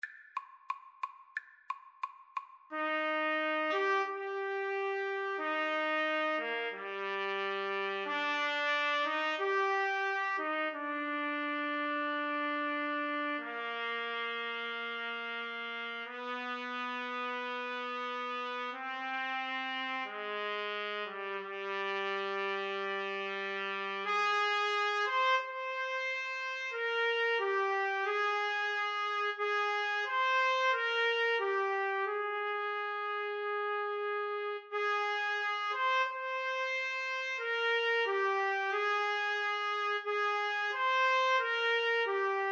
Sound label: trumpet duet